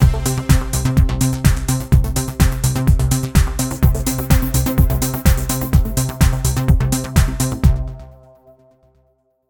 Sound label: Signature Sound; FXHome; Signature Tracks